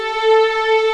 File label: A4 LEG MFI R, The Ultimate String Collection; East West